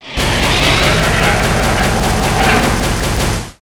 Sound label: leviathan, monster